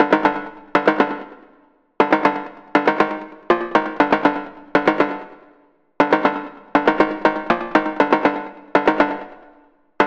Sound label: Pluck; Magenta Skeleton; Routed Sound; Vital Expansion - Noble Gangster Vol.1